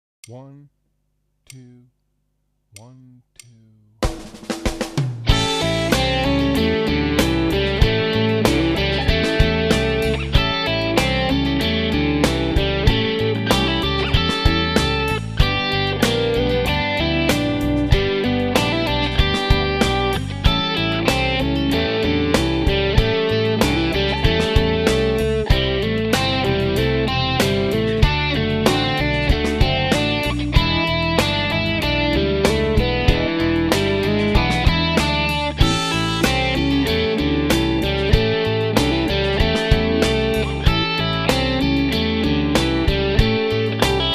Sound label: Backing for Rhythm; Play Rock Guitar - Ed Wennink